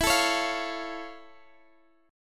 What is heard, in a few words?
F Augmented Major 7th E